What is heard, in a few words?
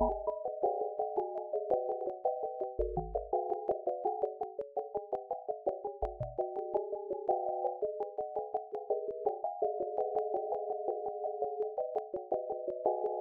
high synth speedy